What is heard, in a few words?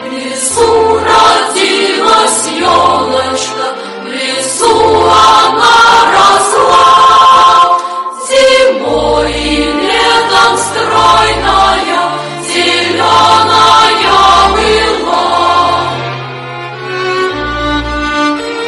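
christmas tree russian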